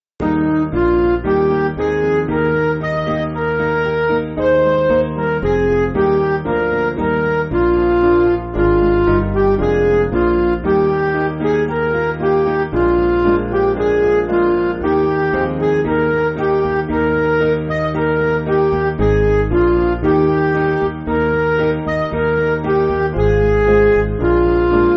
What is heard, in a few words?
Midi